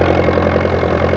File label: TRACTOR; M8; CMI Factory